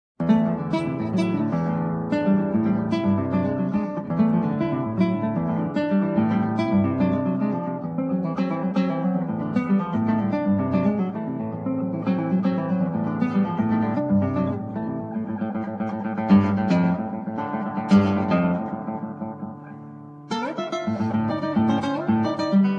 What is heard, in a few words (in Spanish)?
Sobre un canto de Bulgaria, Guitarra